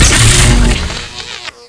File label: core zap; dm criticality